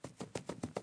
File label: fast run